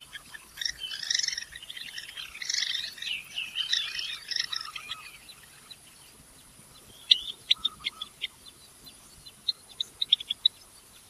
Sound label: Java Sparrow courtship or conflict calls; Amar